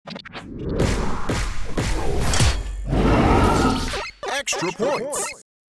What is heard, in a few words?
dino; pinball